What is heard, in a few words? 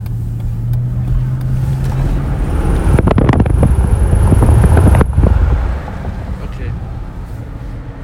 dodge magnum rt squealing whistling